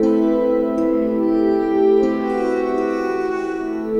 Pretty Poink End, Pastoral; Sonic Foundry (Sony Creative Software) - Friendly Fire

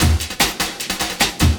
LOOP, 150 BPM, Drum & Bass

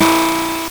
LSDj, Nintendo Gameboy; 300 Drum Machines

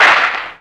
PRC KRAK, Clap & Snap; Rhythm Section; Clap Modules

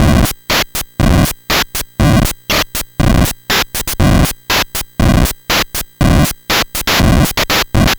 NES Noise Drum Loop, 8-Bit; Nintendo Entertainment System; Drums; Chiptune; Sonic Foundry (Sony Creative Software)

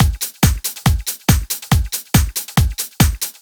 Addon Loop, Drum Loops 140bpm, Perc Loops

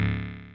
BUGGY BASS; Pro-Rec - Synthsonic; TRINITY

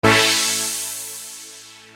Major Thin; Construction Kit; HIP-HOP; Sonic Foundry (Sony Creative Software)

